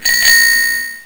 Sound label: bicycle bell